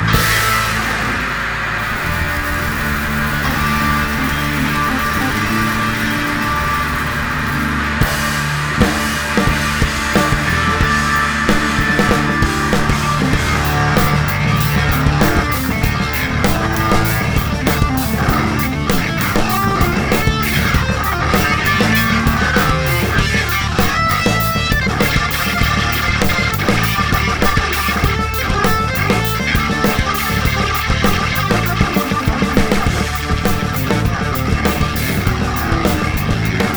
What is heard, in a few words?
Improvised; The Santa Barbara Bowl, Santa Barbara, CA, USA